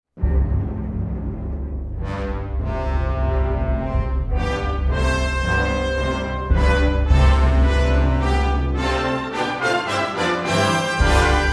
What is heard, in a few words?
National Anthem of Slovenia; Slovinsko